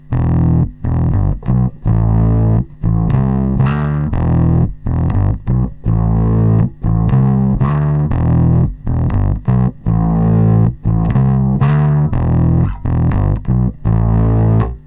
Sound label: hi